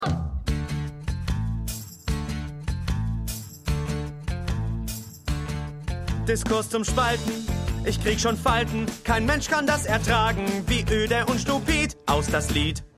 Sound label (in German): Short Gesang; The Good Fight